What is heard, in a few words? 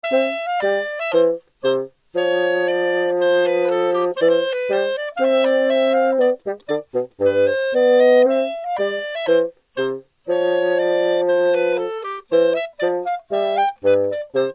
duet dry